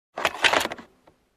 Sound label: Vintage Camera Shutter Sound